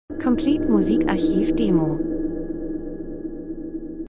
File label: transition; Transition & Movement - dunkel fliessend spannend